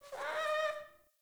Trapped Beaver Call; Encounters; Animal Rescue

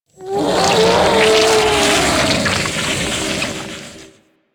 Attack, Infested; Barks; Warframe; Ancient Shell Agent

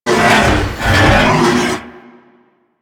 Derelict Elevator Door Malfunction Open; Elevators; Orokin

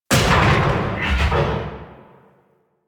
Decoy Counter Measure Mech Initial

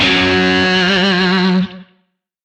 Shawzin Oct One F Sharp; Music; DE; Warframe; Lizzie Shawzin

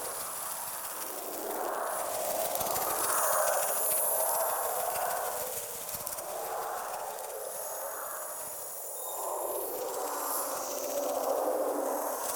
Active Loop; Powers; Fey Eldveil

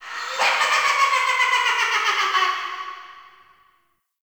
Halloween Loot Hint Evil Laugh; Seasonal

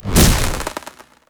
Aoe Shockwave Impact; Powers

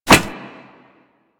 Wall Grab Metal Foley; Warframe; Tenno

